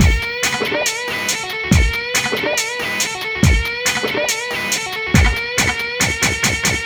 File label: Hardcore Breakbeat, 140 Bmaj; Big Fish Audio; Hardcore Breakbeat Action; Goliath